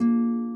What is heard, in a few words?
harp